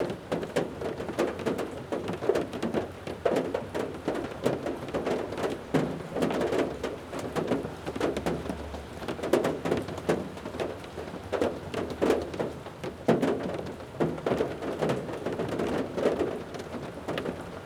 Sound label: HAIL LOW IN; SIMUZENSOUND; WEATHER; SIMUMAK